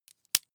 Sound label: meter hit